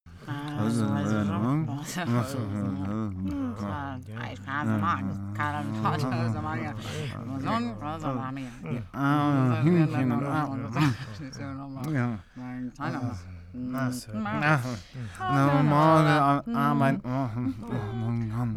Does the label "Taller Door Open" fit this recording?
No